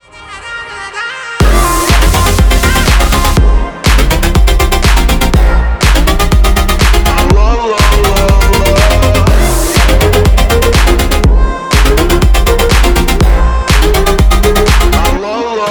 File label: instrumental, ringtone